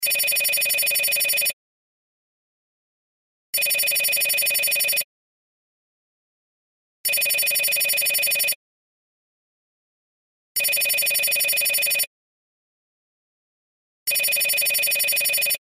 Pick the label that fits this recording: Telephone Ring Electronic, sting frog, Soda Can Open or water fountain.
Telephone Ring Electronic